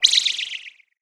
thrown away; funny

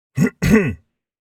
throat clearing; human body sounds

